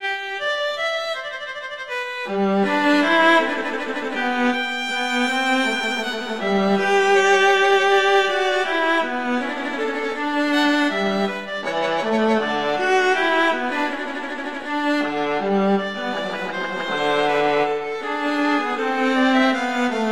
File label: Larghetto; Telemann; Canonic; Sonata 2